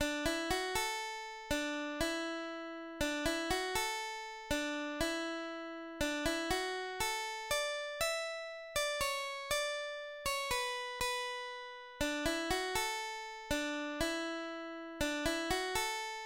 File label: Waltz, dulcimer